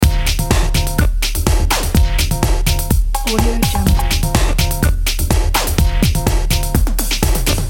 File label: groove drum loop